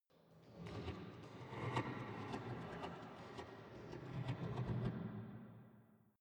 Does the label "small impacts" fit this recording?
Yes